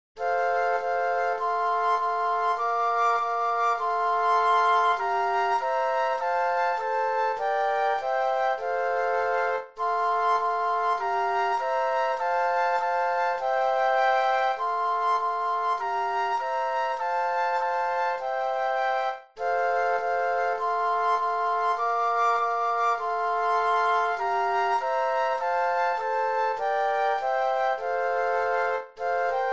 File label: flute trio